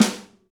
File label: Pop Snare; Snares